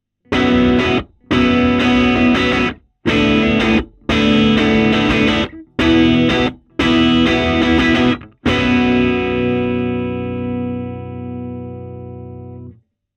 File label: Guitar Box - C12 Omni - Edge modelled